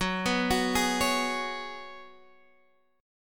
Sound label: Db 7th Suspended 4th Gb, chord